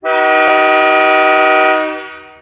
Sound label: horn, trainhorn; GTW